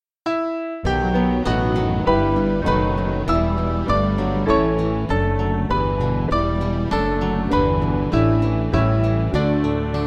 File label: Come Dearest Lord, Band